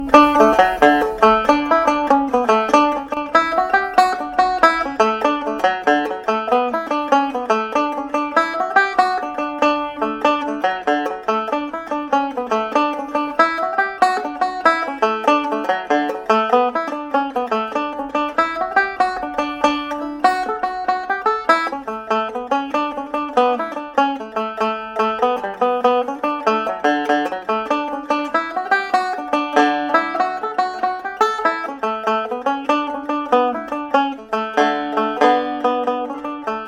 father o flynn banjo; tune tutor

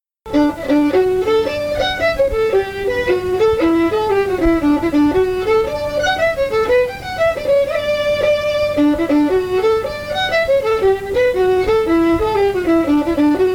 smash the windows fiddle; tune tutor; SeamusGrantFestival

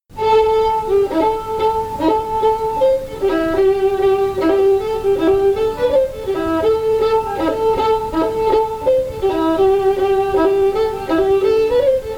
highland fiddle; george owens highland; tune tutor; SeamusGrantFestival

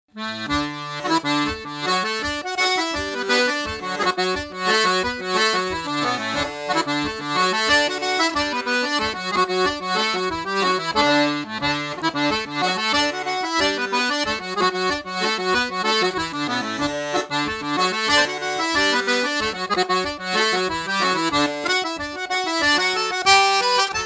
piano accordion; master mcdermott; tune tutor; SeamusGrantFestival

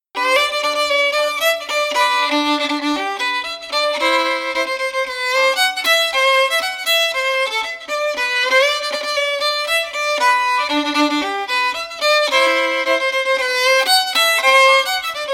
sixteen hand reel tune two fiddle; tune tutor; SeamusGrantFestival

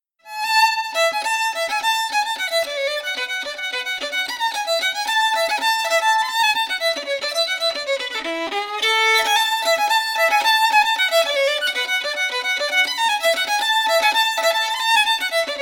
devil among the tailors fiddle; tune tutor; SeamusGrantFestival

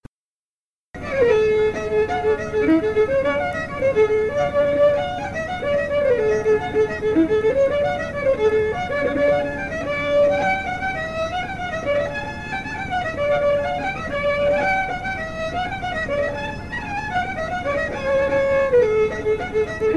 reel fiddle; orourkes reel; tune tutor; SeamusGrantFestival

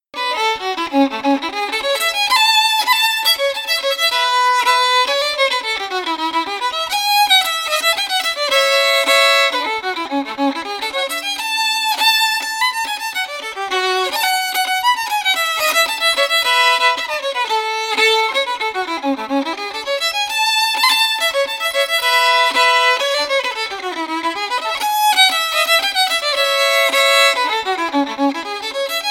first century reel fiddle; tune tutor; SeamusGrantFestival

